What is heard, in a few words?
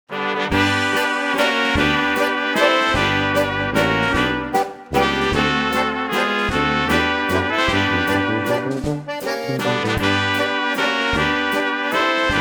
Waltz